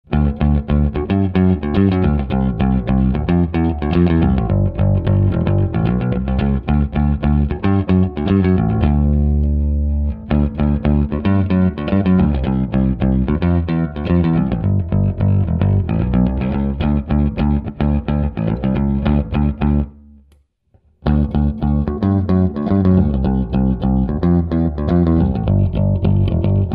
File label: gold bass; jazzmaster bass